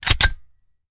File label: reload empty xmag charge; gmod